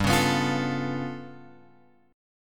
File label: G 9th Flat 5th G; G9b5 Chord {3 4 X 4 6 5} strummed